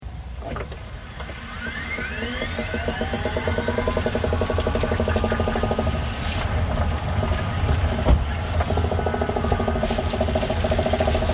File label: tapping; cars; ev